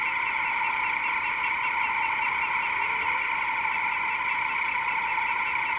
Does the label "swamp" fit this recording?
Yes